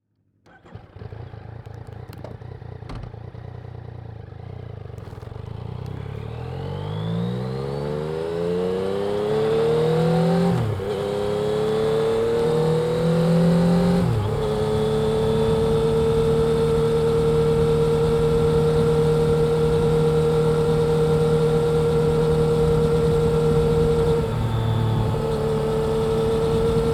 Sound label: bike; zoom h2n